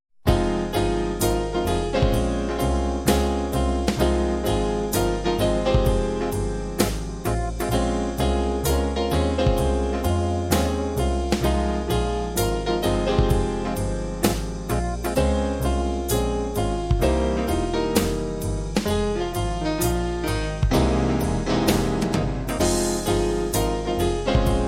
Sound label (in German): Moll Blues